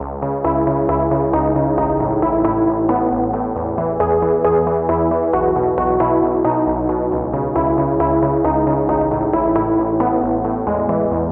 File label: Pluck; Routed Sound; Spire Expansion - Reality Maker Vol.1